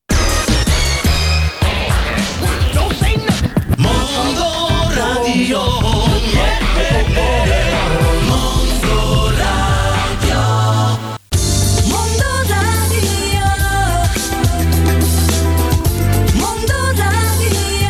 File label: Jingles; Mondoradio